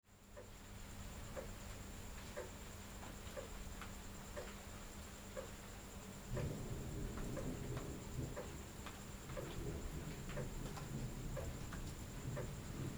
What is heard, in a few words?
Rainstorm